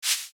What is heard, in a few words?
Slide close; Nokia; X5-01